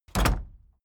case lock close; objekte; tueren

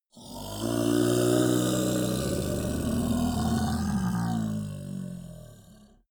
monster dark growl bubbling; kreaturen

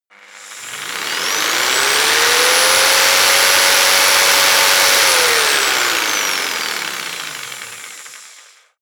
jigsaw engine medium fast long; maschinen; saege; soundpool

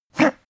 pug gag short; natur-tiere; hunde

